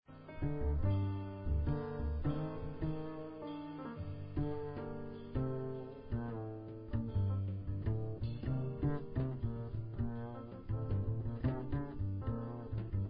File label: Solo